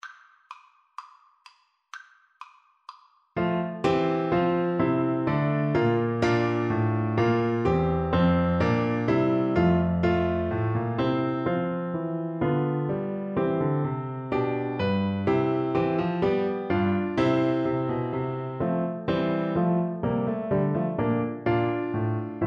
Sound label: bourree, Clarinet